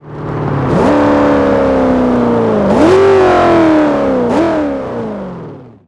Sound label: throttle off, vehicles; dod challenger15